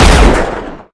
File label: automag SHOT; Unreal final